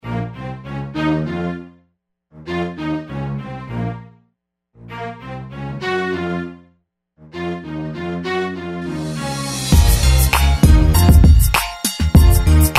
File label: hip hop